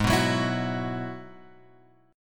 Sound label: G sharp Minor 7th Sharp 5th G sharp; G#m7#5 Chord {4 2 4 X 5 2} strummed